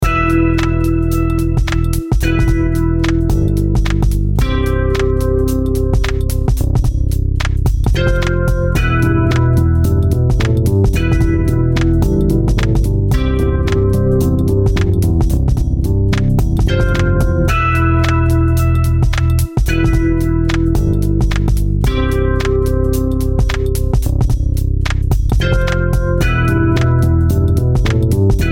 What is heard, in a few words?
BASS BATTLE